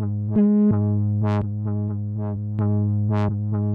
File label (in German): basslauf no legato